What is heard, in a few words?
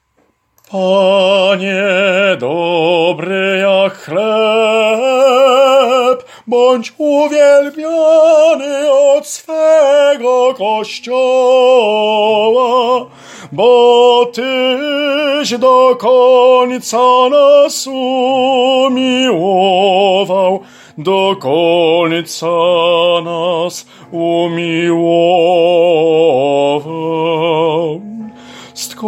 TENOR; Panie dobry jak chleb